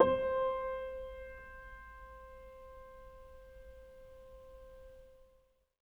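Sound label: Acoustic Piano, Left; InVision Interactive; Miroslav Vitous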